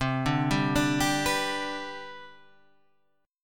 C Major 7th Suspended 2nd, C, down, Guitar, Half Step Down